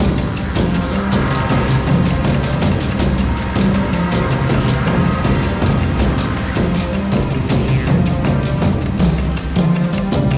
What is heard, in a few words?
Fight 43 Bar 160 Bpm